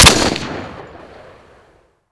ump45, weapons; ModernWarfare

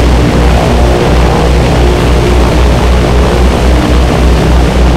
b17 rear, engines; War Thunder

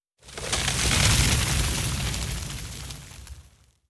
jet snowfall rear; cliff; Call of Duty Modern Warfare

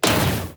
damage hits explosions; World Of Warships

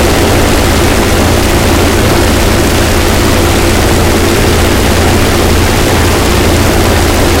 engine bomber close; engines; War Thunder

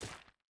footstep burlap stones run; Rust

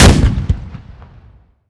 Cannon Mortar; Weapons; DCS

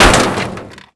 ammobox; Call of Duty Modern Warfare; physics

